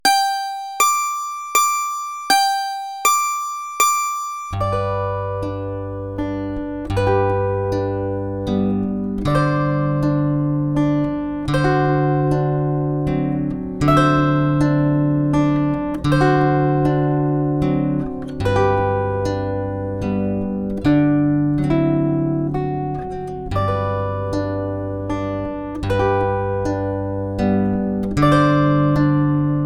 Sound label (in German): Zweistimmiger Jodler, Zither; Playalong; Neue Zitherschule